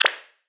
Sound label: back button click; saiyku [rby] instafade